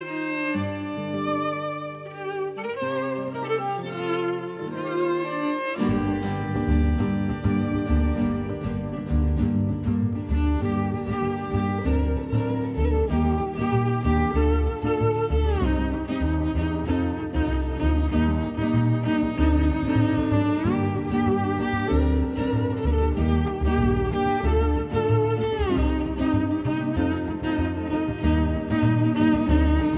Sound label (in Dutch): instrumentaal